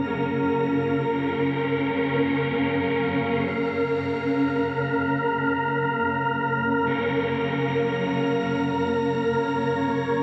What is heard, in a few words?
Synth Pad; knights; Galahad